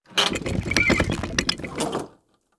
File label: Raised To Lowered